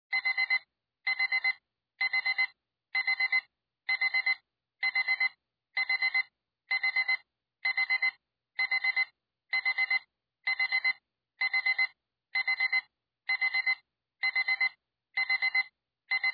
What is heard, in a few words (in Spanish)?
alarma del reloj